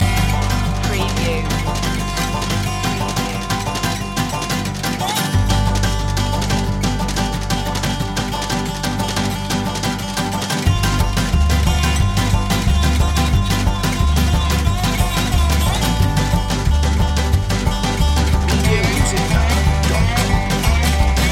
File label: hickabilly hoedown loop